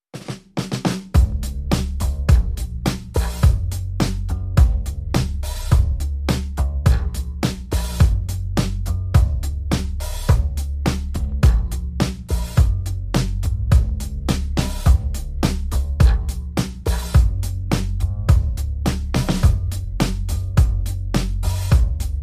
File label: Drums and Bass Only